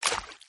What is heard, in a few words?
sploutch